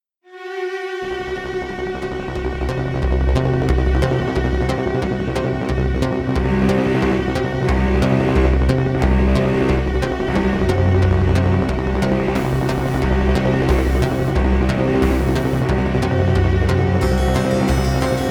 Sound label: Suspense